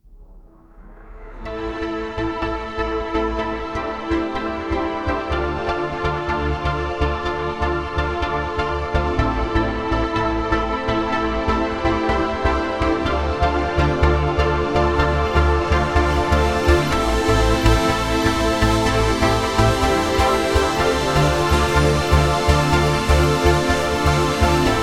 trance ibiza beach sun 4 am progressive synthesizer 909 dramatic chords choir euphoric; nostalgic